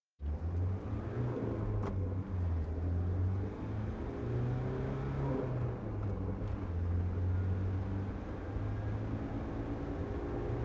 renault master f3500 dci135 engine acceleration interior stereo; Sonniss GDC